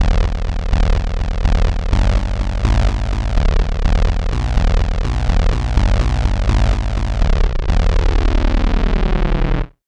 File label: fifth sawtooth wave bass